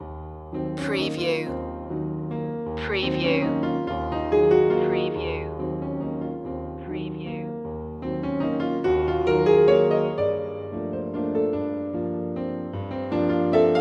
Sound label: lydian poetry loop